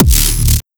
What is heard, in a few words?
Kick Dist Rippin